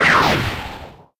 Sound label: magic missile miss; wesnoth